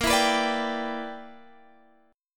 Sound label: E Minor 7th Bb; Em7/Bb; strummed